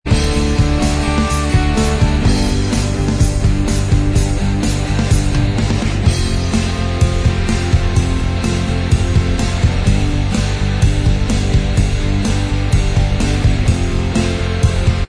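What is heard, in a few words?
instrumental track, backing tracks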